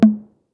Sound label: Tom Mid Electronic; Drums